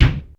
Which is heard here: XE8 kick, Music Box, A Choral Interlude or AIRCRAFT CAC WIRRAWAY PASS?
XE8 kick